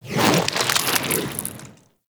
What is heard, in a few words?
Landscape Fungus Drop; Warframe; Venus Open World